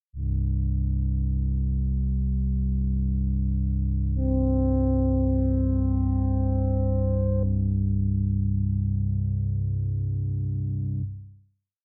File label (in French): pad sans effet